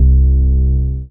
DM BAS, Dance Mega Synth

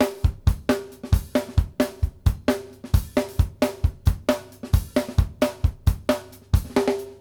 BASIC D R, Drumworks

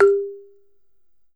BALAFON, African Melodic

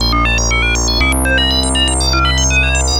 LFO Bass; Sonic Foundry (Sony Creative Software); The Electronic Music Manuscript Collection; Bass Foundations

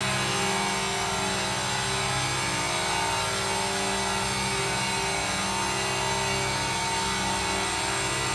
Neon Lights; Zero-G - Future Wave

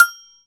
Agogo Bell Mute High Hit; Sonic Foundry (Sony Creative Software); Joe Vitale Latin Percussion

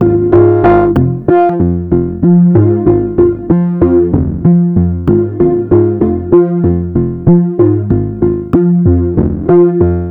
SynthBass, Synth Bass Loops; Sonic Foundry (Sony Creative Software); Groove Elements

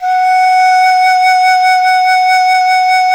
BANDI, BAN-DI FLUTE; Spectrasonics; Heart of Asia

